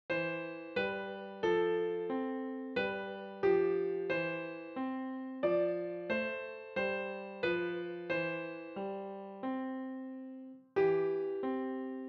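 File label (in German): O du fröhliche, Klavier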